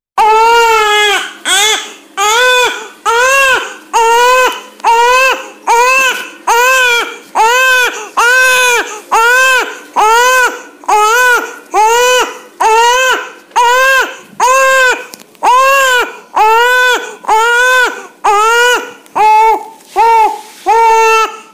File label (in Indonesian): suara bayi nangis